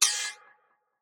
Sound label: Perc; Percs